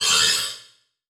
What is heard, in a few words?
Buttons Mechanical